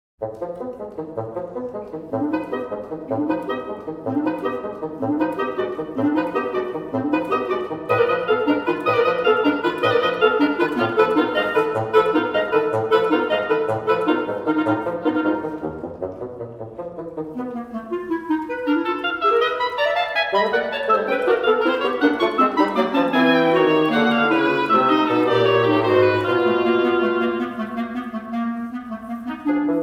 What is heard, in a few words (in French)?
Suite Pour Trio D'anches, Scherzino; Rustiques